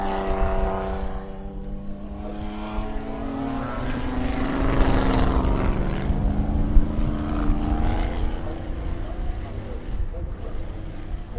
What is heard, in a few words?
Pre 61 Sportscars